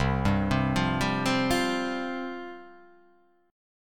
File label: C Suspended 4th C